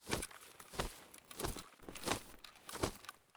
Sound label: sprint hand; STALKER; IWP